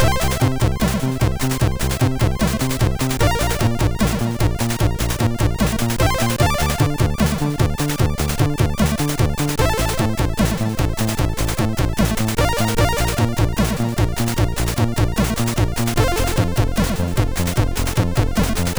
highscore MOS6581R2

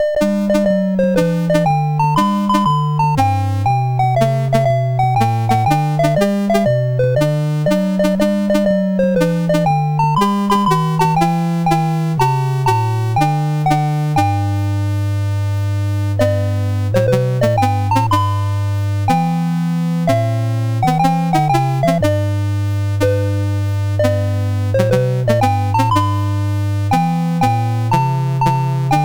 Battle Hymn BASIC, CSG8580R5

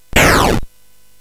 Frantic, CSG8580R5

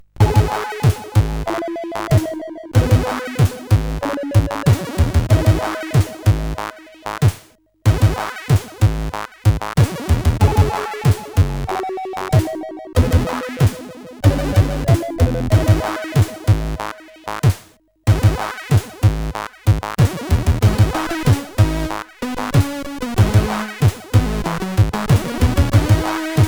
Cracking All Night, CSG8580R5

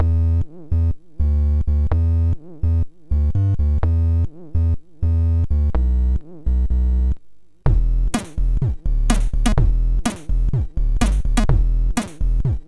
Tekno, SID MOS6581R4

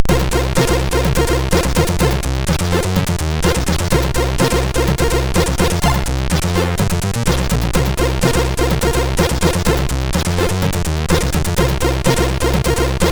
Triad, sid MOS6581R3

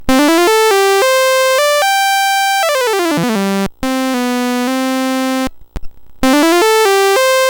Fanfare, sid CSG8580R5

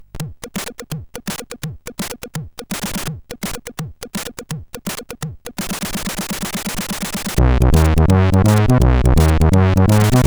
Rock n Roll, CSG8580R5

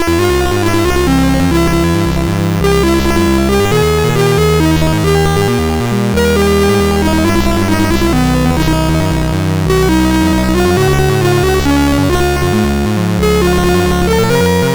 Randomly generated music BASIC, sid MOS6581R2

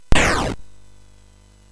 Frantic, MOS6581R4

